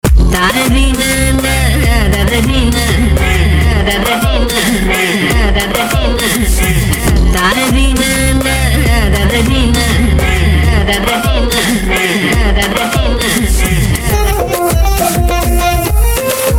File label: Trailer BGM; Double ISMART Trailer BGM Extended Mix